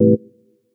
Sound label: stab organic, stabs